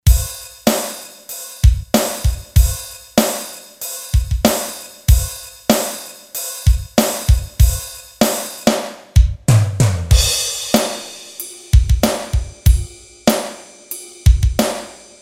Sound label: ROOM, Roland SC-880 Rhythm Sets; Factory